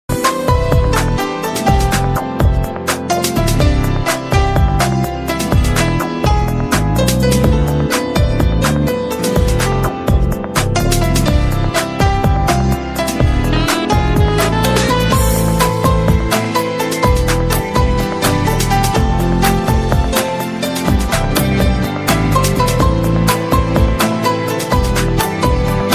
emotional ringtone

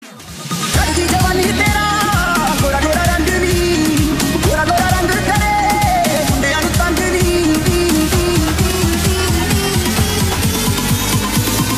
bollywood trap mix ringtone

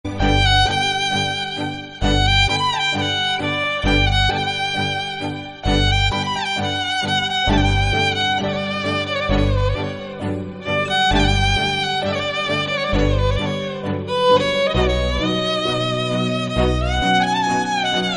sweet instrumental ringtone

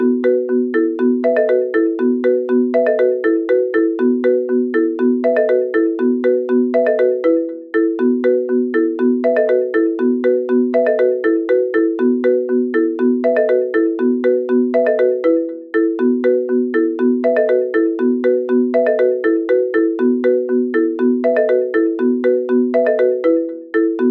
apple ios 13 ringtone

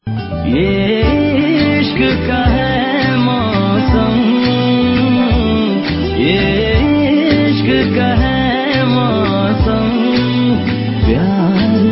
latest bollywood ringtone

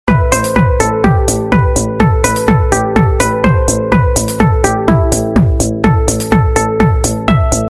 heart touching tone ringtone

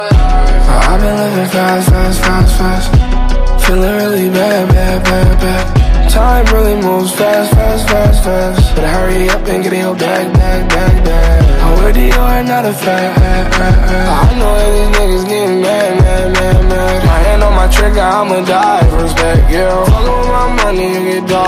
fast ringtone

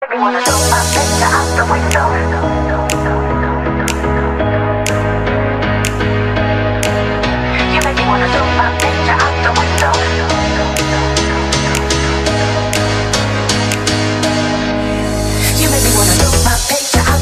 electro ringtone

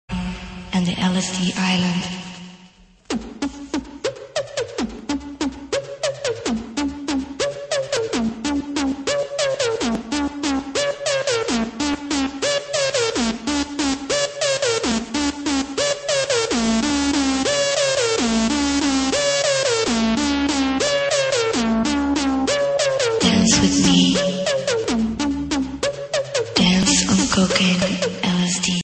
ringtone

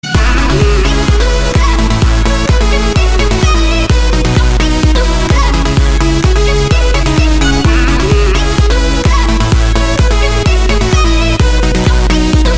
iphone 8 mix ring ringtone

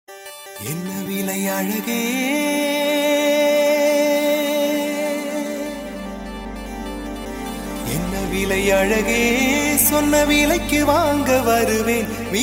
tamil ringtone

